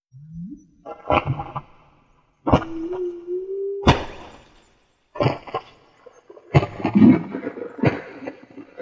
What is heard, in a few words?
slaps